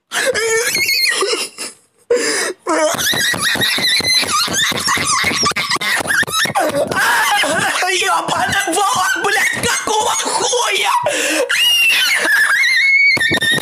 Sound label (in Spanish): nino ruso gritando